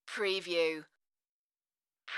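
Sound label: Triangle Hit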